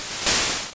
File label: Bubble